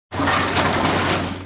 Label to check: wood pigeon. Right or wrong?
Wrong